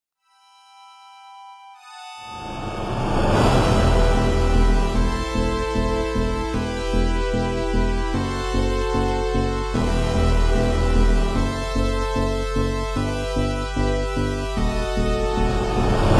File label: 8-bit Epic, chiptune music